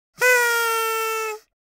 funny cartoon sound effects cartoon sad party horn